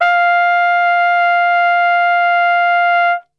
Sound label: F 3, Brass; Best Service; Brass Super Section